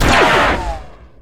blaster; clone commando

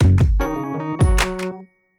bank jingle

